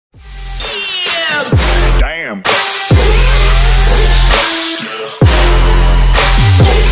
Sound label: alert follower sub donation sound effect, twitch